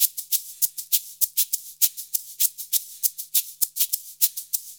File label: Modern Percussion Loops; Soundscan vol.56; AKAI